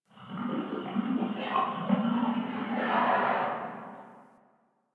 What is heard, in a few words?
Digest Interior Small, Ambience